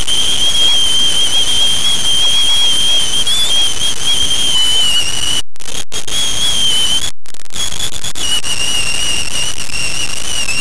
Hardcore Squeal

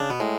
Failure Wrong Action

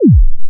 Bump

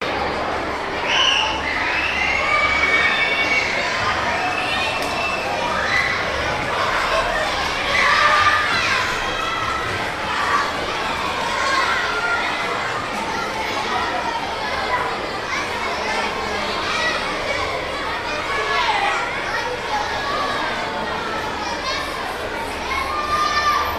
Nervous Children

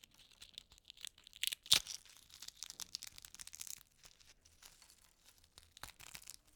Egg Shell Empty Cracking

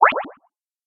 Beeps

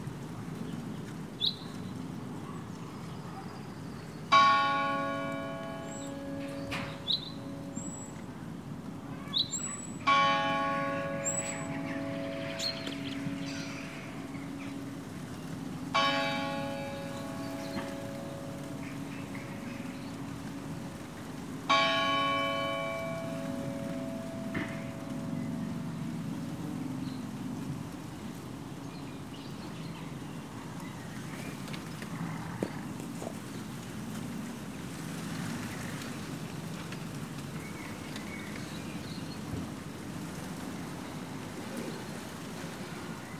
Bell church 4pm

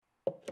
Cup

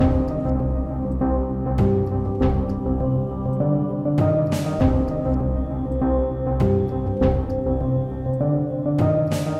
Epic Hybrid Intro

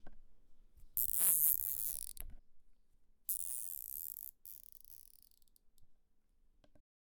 Cable Tie

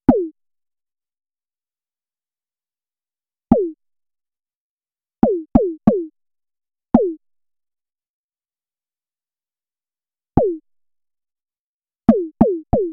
140 Bpm Attack Loop

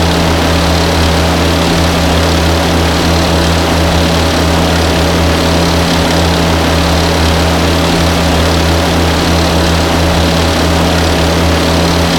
Leclerc Acceleration Sound

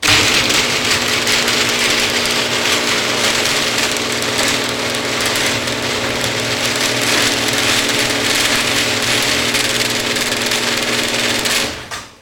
garage door

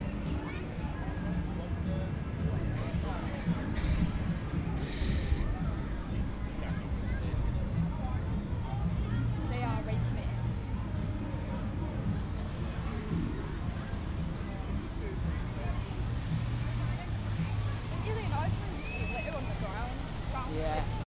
Crowd Ambience Park; Heaton Park

